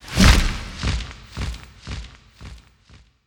Wings; Bahro

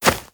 Grass Run

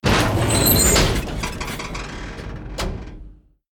Upper Elev Away Bottom

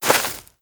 Grass Walk

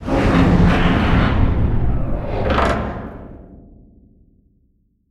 Fan Power Off

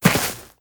Grass Walk

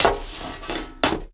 Stone Turn

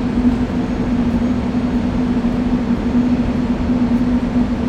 Fan Node Amb Loop

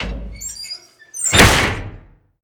Hatch Close

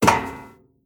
Metal Walk

